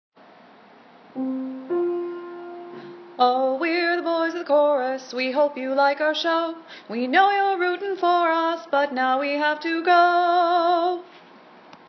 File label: Boys of Chorus